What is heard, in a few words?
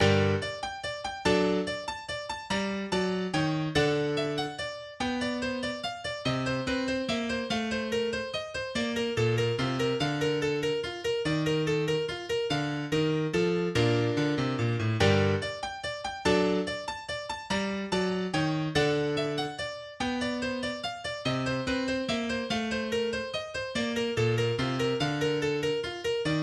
Minuet in G Minor